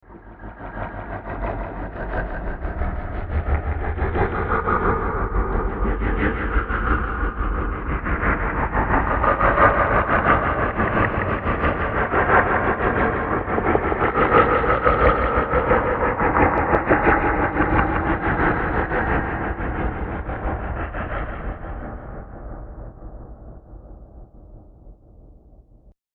Evil Ambience, scary soundscape; The Residents Are Evil; horror

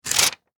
Office Notebook Tear Paper; Ripping; Objects

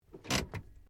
CAR SUBARU LIBERTY INTERIOR HANDBREAK ON; Family members Subaru; Cars (Sound Effects:Vehicles)

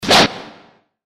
Flash Animation Multimedia; Movement Multimedia Web Interactive; Buttons

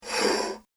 Saucer - Slides And Placed On Table; Dishware Sound Effects; Household Sound Effect

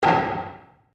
Wood On Wood Table; Material components for constructing more complex sounds; Sound Effects:Materials

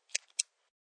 Bic Pen On, Clicking sound of a ball point pen on; Classroom

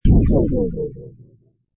Magical Reality Warp; Lynne Music

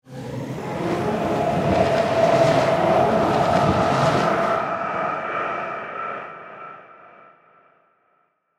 Ghostly Demonic, Horror, Ghostly, Demonic, Swirl; Ghosts (Sound Effects:Horror); BlastWave

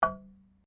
GAMALAN BAMBOO P, Indonesian Gamalan copy; Sound Effects:Musical; Foundation3

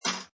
Pinball Sound Impact With Loose Metal Plates; Video Games

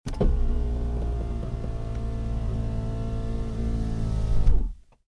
Car: Electric Window Close, Interior; Vehicles; Car Sound Effects; Cars (Sound Effects:Vehicles); Stuart Duffield

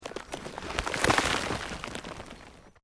Earth Loose Move Short

